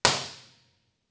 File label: Small Room Imp Resp 16k